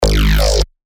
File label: bass shot G#; Soda; revealed bass shots vol 3